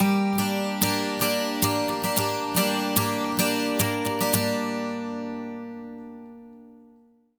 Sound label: 110 12STR, Acoustic Guitar Loops; USB Soundscan vol.04 - Electric & Acoustic Guitar Loops; AKAI